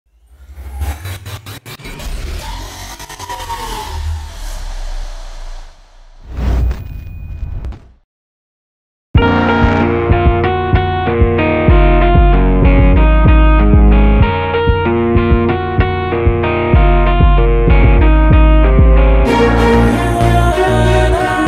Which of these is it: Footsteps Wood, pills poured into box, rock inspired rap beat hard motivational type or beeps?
rock inspired rap beat hard motivational type